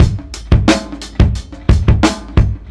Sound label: KORG Electribe SX BEAT